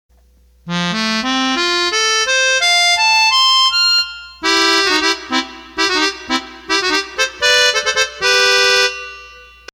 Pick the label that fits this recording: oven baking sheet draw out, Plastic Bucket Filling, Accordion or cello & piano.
Accordion